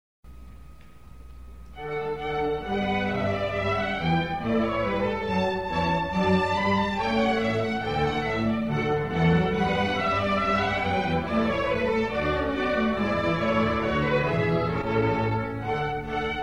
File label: Gavotte